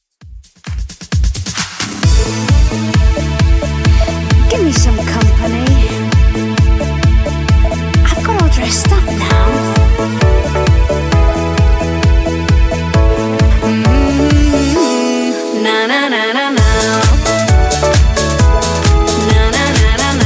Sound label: 90s Redrum 132bpm